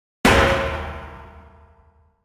frying pan; ضربه زدن با ماهیتابه

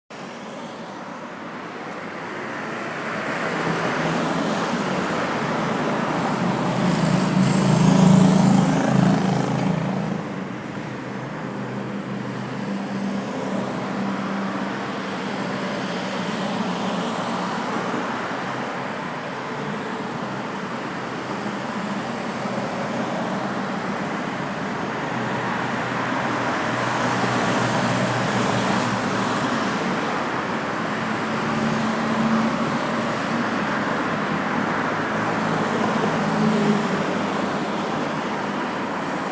Quiet highway; اتوبان خلوت

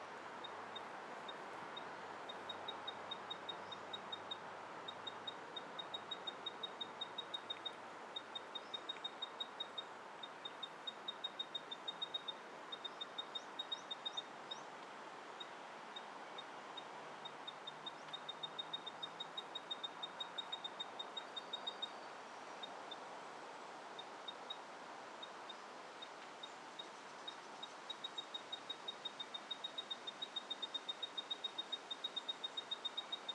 Eastern spinebill

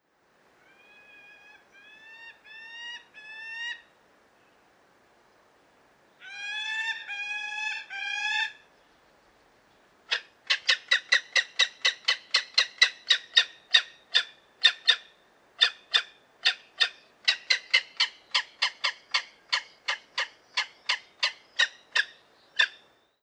Gyrfalcon

